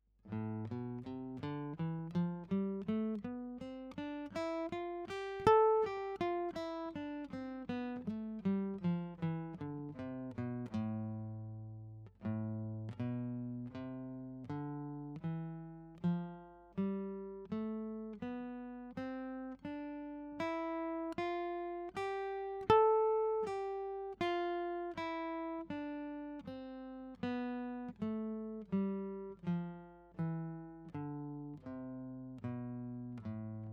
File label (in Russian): Gammy flamenko garmonicheskij Lya minor